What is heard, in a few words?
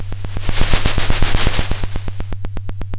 pop, Sound of a drum roll